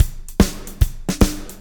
POWR, Backbeat, SHUF

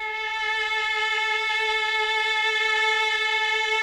CLN STG B-3, CHAMB STR; Sonic Reality; Vintage Timetraveler

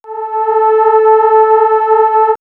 Swirls Voice, pads; Obie Voice; X-Static Goldmine

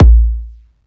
sub, sub bass

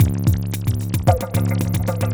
Terrestrial Hybrid, Processed Percussion

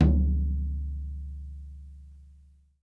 FLOOR; Sampleheads; New York City Drumworks VOL-1

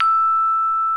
MARIMBA VIBES

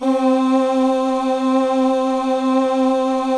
PAD, DIGI-PAD

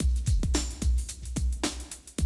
Classic DrumLoops, 110

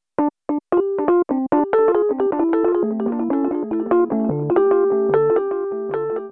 Rhodes Break, Cinematic Funk; Sonic Foundry (Sony Creative Software); Cinematic Funk Urban Tone Poems

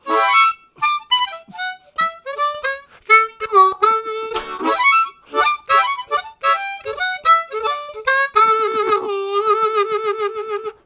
High Gloss, Harmonica Riffs; Zero G

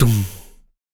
TOMS PITCHD, Male Perc, Vocal; Spectrasonics Vocal Planet; Jazz FX

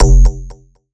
Bass F, Bass Synth

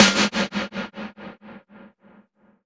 Delays; Zero-G; Soundclash

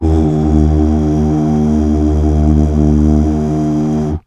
UUH

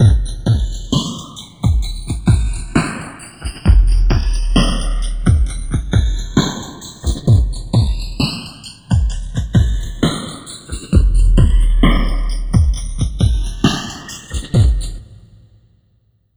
FILTERS R, Dark Side Of TripHop; USB Soundscan vol.35; AKAI

